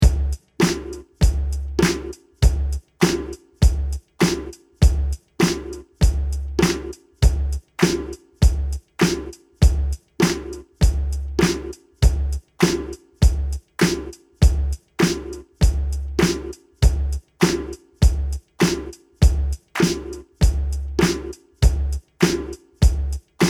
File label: Belong Song DRUMS